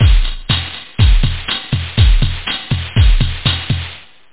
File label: House Drms; boomboxdemo